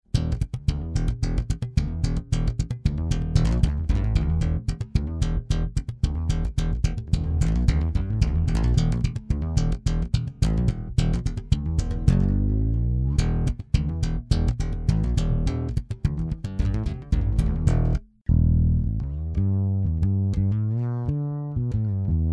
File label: Godin Fretless Hi-Z; Black Lion Audio Auteur MK2